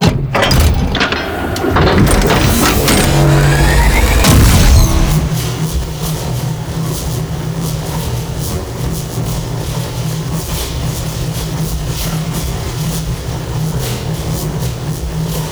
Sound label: hub laser